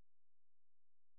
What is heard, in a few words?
C#, Synclav FM, FX; Big Fish Audio